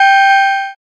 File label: alert glass